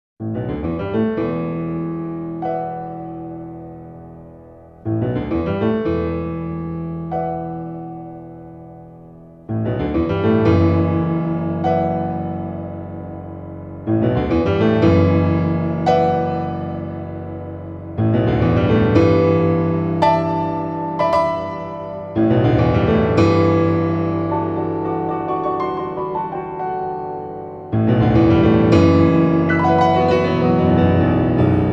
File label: Farewell Melody